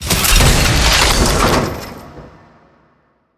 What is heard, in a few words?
item reveal mythical; ui